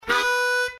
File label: Draw Slap Ta, harmonica